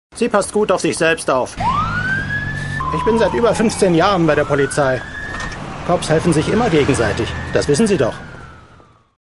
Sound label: emergency room